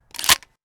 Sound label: weapon foley pickup; Stereo; Real Guns Sound Pack 2